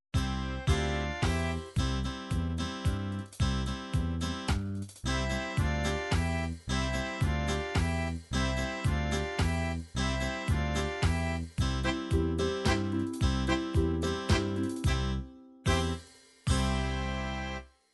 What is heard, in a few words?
latin guaran, midi